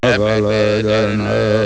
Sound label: male vox, oceania